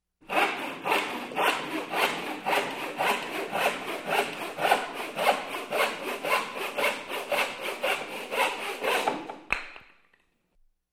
Sawing Wood

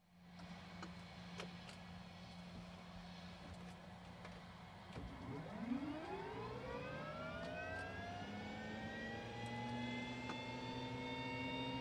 Helicopter engine start from cockpit

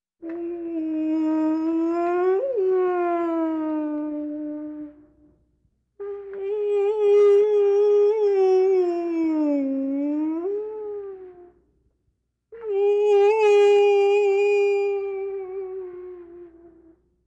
Horrific Humming

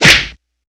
Kung Fu Old School Strike

